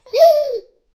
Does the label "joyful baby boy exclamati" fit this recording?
Yes